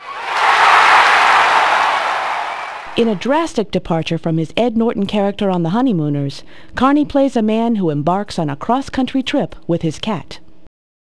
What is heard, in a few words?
CHEER; CompuServe Multimedia Workshop February-March 1995; quiz